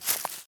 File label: leaves step; Golden Crayon Sounds